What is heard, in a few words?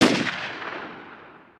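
distant gun, outdoor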